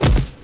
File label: BODY FALL